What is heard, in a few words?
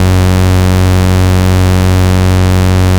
MC 202, BASSES; Masterbits - Vintage Voltage; VV BASSES